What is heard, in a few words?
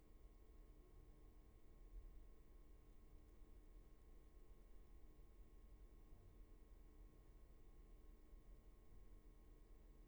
roomtone